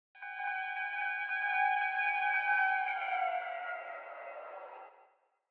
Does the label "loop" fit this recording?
No